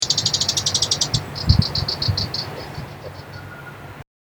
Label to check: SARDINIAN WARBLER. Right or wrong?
Right